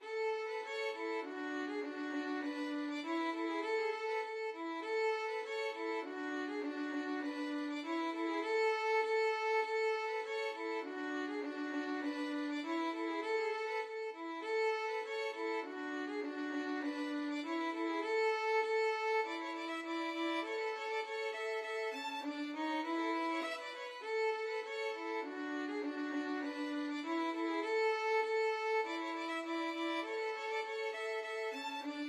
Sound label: la rose violin duet